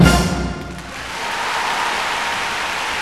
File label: ORCHESTR HITZ